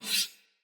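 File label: Dagger Whoosh Accent, Swords; Weapons; Player; Josalfar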